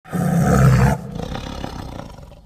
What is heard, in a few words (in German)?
schnarchen